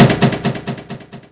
flag return; ctf; AssaultCube

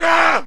man hit; Mount&Blade Warband

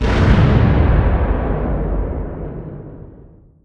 distance ships destroyer attack; Hearts of Iron IV Death or Dishonor

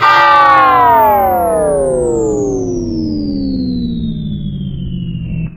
church bell end; Left 4 Dead 2

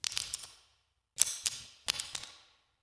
rat path; ambient, creatures; Left 4 Dead 2

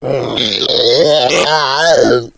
female boomer lurk; Left 4 Dead 2

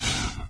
suit sprint; Garry's Mod; hl2

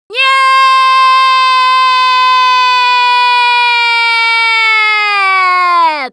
fall, russian, Survivor Voice Producer

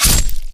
sword hit lo armor hi dmg; Mount&Blade Warband

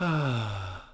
Archer Heal; Sid Meier's Civilization 4 Complete; Warlords; Units

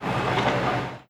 construction; Hearts of Iron IV Death or Dishonor

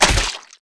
body medium impact soft

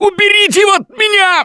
scream while pounced; Gambler; russian

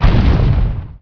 building thud; Emperor - Battle for Dune

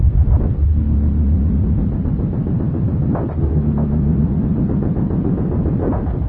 alien power node, ambience; Garry's Mod; hl2; HL1

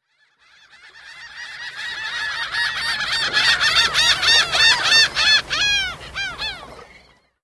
random gulls; random amb sounds

